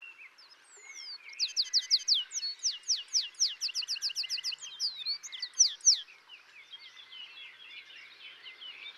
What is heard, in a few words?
Serinus canaria; Chordata; LandBirds